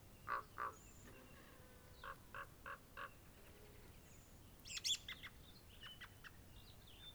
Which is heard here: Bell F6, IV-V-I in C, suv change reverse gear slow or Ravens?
Ravens